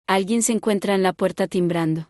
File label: bell